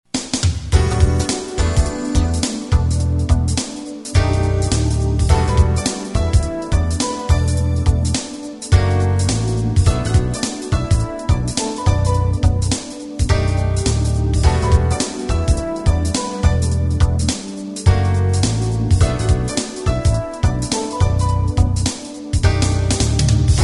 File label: jazz